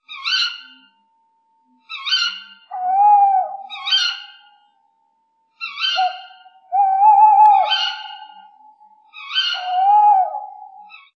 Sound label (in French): chouette hulotte